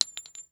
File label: item metal tiny drop